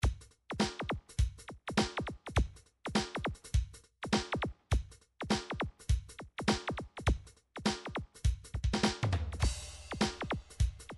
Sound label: drum midi files pop rap